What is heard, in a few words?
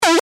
Very Intense Synth Beep; electronic; Beep Sound Effects